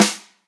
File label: Snare Bright